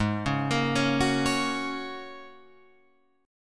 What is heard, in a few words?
Ab 7th Suspended 2nd Suspended 4th down Guitar Open F; Ab7sus2sus4; arpeggiated; chordshape; JGuitar